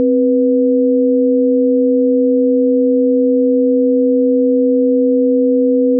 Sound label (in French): sine